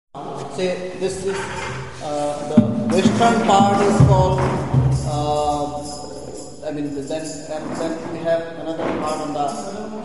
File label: eastern assamese tune, India; WFMU